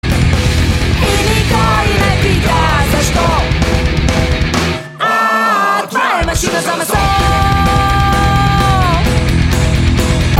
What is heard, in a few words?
win, music